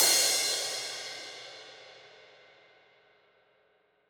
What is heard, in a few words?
Trash F, drum